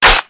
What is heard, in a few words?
m16a4 bolt pull